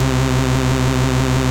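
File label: Rave Synth, Dance Mega Jungle Rave; Best Service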